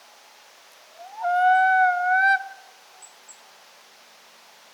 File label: Common Loon - song, Eistaucher